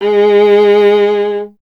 viola, solo instruments

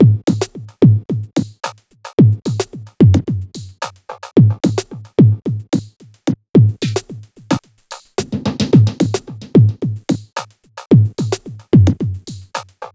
Beat, Drums, Eclectic Electronica

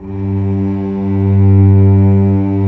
cello G1; AKAI S-Series CD-ROM Sound Library VOL-2

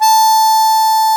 HARMONICA

